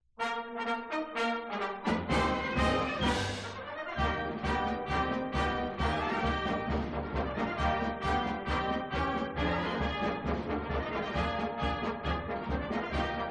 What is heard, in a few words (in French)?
carillon; 1958; Regiment de Maisonneuve